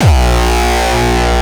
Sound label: kick; shock. Ultimate Kicks vol. 2